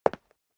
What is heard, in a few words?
step leather shoes concrete single left